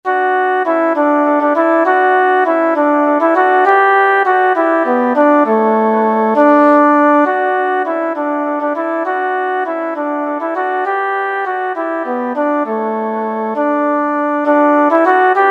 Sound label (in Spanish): Unidos en el canto VOZ